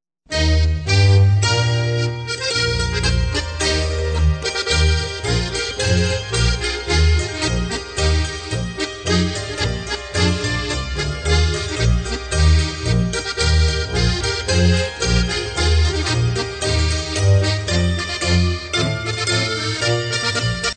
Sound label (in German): MARSCH